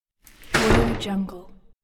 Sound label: door closing corridor bang